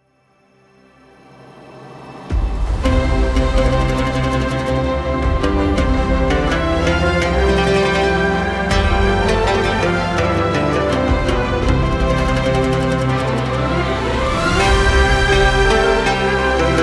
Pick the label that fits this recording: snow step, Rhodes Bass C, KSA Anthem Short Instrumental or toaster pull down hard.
KSA Anthem Short Instrumental